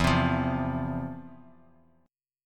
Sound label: F 5th E, chord; F5/E strummed